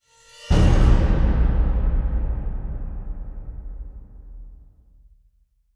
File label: Stinger